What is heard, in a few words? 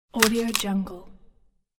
soft crack; game